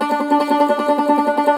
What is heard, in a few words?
cumbus saz D, plucked strings; E-MU Producer Series Vol. 7 – Old World Instruments